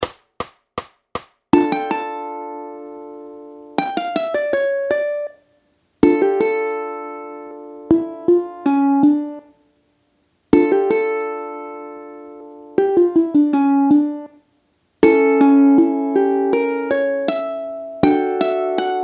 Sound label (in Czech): Toccata a fuga D moll, Ukulele; Klasika; Super Snadné Ukulele: Klasika pro samouky a začátečníky